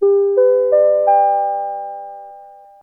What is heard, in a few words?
4 tone chime up